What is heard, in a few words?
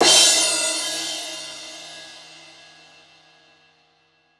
cymbal crash; Voice Crystal - Steve Reid Definitive Percussion